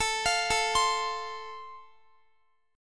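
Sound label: A Minor Sharp 5th, Guitar, chord strum; x,x,19,22,21,19; arpeggiated; Am#5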